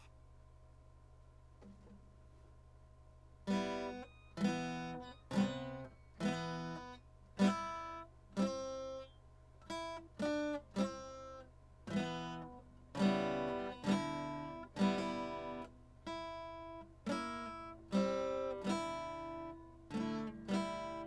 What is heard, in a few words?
star spangled chords